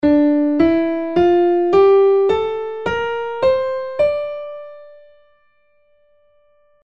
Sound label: Scale of D Minor Natural